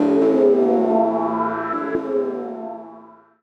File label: KEY SHOT TEKNO 140 A; Techno2; Singleshots